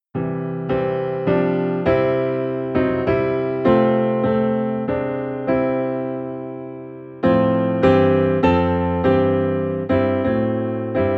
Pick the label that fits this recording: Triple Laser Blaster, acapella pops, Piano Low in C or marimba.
Piano Low in C